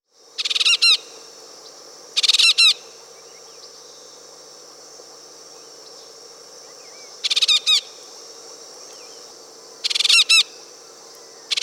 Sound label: Yellow-tufted Woodpecker (Melanerpes cruentatus), Peru; S. America